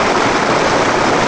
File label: waterfall; Pirates Of The Caribbean